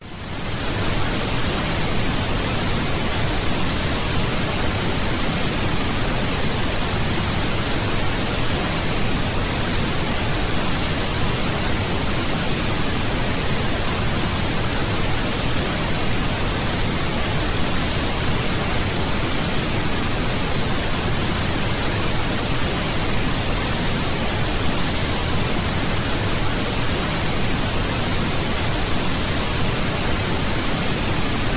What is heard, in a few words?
Pink Noise long